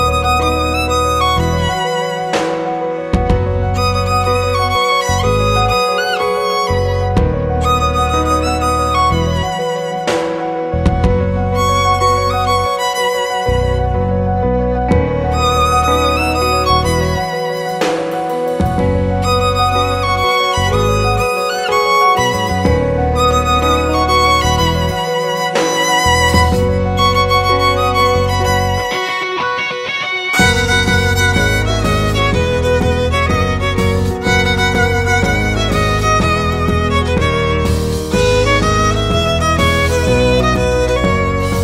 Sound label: Violin cover